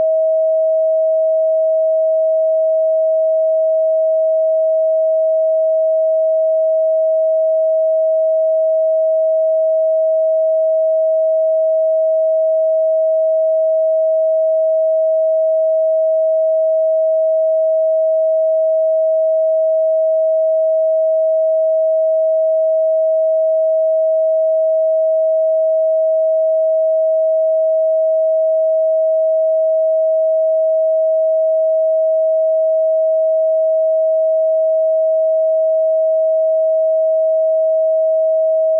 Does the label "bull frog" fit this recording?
No